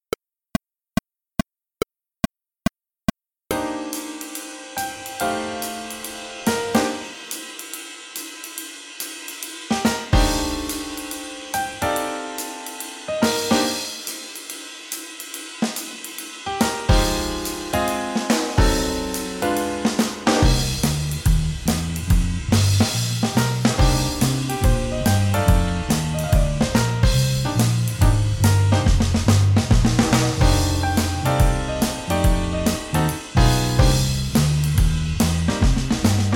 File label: With drums